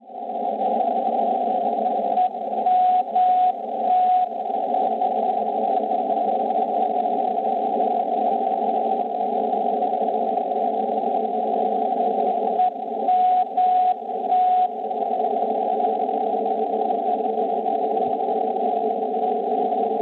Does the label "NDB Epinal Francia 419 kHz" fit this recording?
Yes